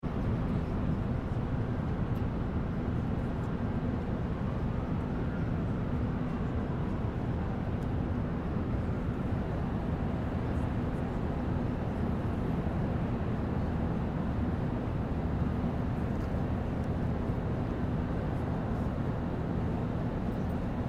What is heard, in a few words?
Empire State Building, New York City noise recorded from observation deck.; Distant city roar, some chatter. Long distant siren.; City Sound Effects; Lynne Music